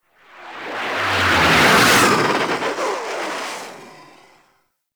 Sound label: Fighter Ship Close Fly By, Space Fighter Ship Engine; Warframe; RailJack; Enemy Ships; Grineer